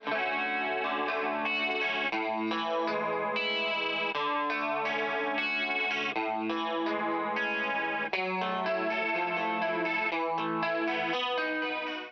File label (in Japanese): Lコーラス